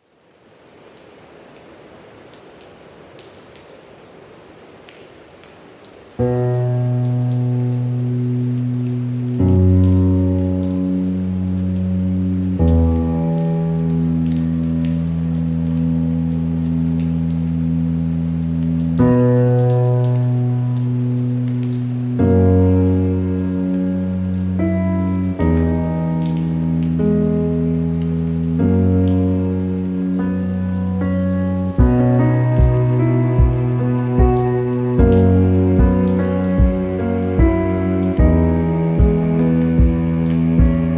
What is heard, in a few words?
Rain